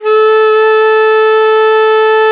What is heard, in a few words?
DIZI, Winds; E-MU Producer Series Vol. 7 – Old World Instruments